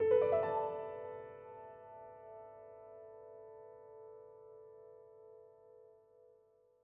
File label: Fanfare; StickerClone